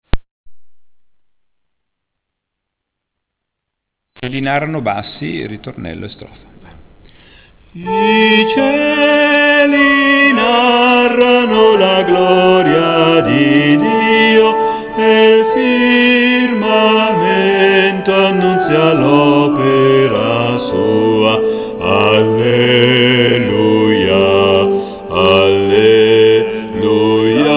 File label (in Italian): bassi; i cieli narrano